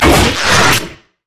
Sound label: stretch strike; LoL; zac base